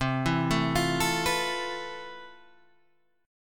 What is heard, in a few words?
F Major Sharp 11th C